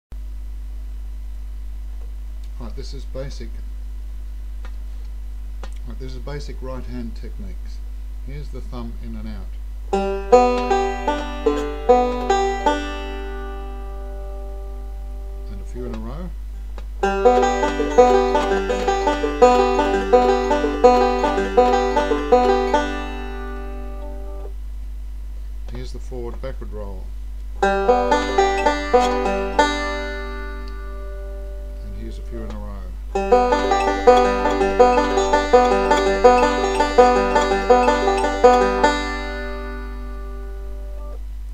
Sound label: basic rolls, banjo